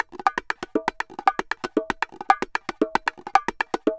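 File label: BONGO SALSA, salsa drums and percussion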